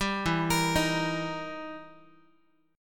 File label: E Minor Major 7th Flat 5th, E, down, Guitar; arpeggiated; JGuitar